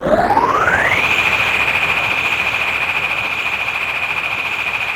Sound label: inhale start